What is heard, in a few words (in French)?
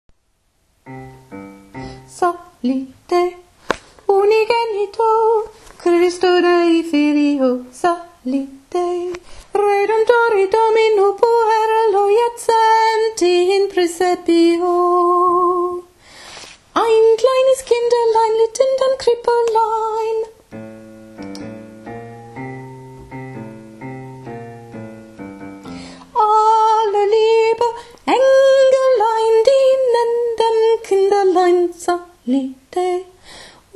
soprane; psallite